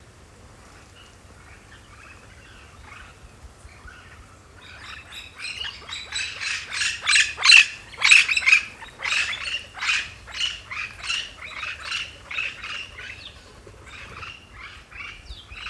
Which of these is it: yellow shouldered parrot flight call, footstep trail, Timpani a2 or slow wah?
yellow shouldered parrot flight call